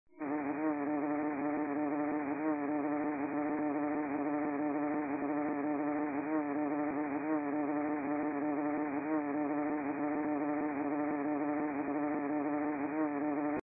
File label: Buzzing long soft; English Prep Package; DizzyBee